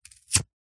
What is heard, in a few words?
household plastic flint cigarette lighter flick single; zapsplat